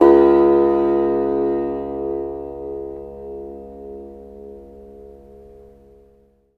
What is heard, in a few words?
SITAR B; Spectrasonics; Heart of Asia